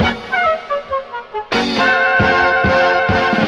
sax polka, Loops; Phonky

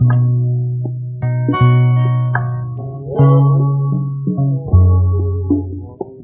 guitar, Loops; Phonky

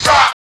"ha" group of men; ooh ahh

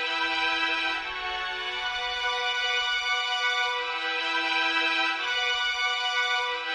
strings haunted, Sad Loops

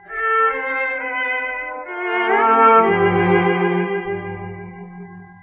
strings, Sad

